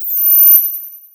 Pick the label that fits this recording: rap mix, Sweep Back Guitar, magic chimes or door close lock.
magic chimes